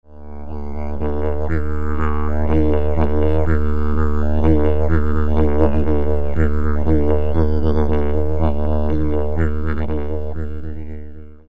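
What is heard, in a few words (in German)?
Bärenklau, Didgeridoo; selbst gesammelt; Dieser ist nur knapp 90 cm lang und liesse sich ohne Mundstück gar nicht spielen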